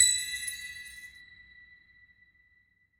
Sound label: count Up End; wilderland